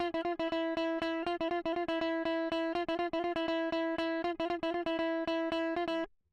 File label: electric